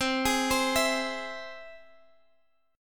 C Augmented down; arpeggiated

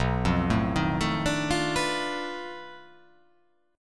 B 7th Sharp 9th Flat 5th, down

